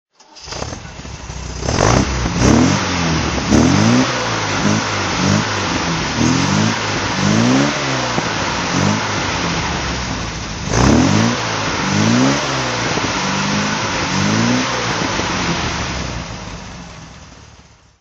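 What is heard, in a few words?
mg mgb roadster throttle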